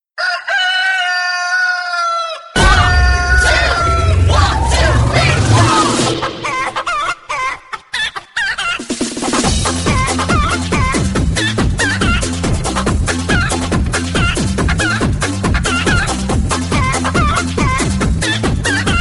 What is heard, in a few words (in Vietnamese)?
Nhac Chuong Tieng Ga Gay Remix